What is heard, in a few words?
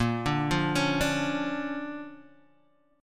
D Minor Major 7th Sharp 5th, A sharp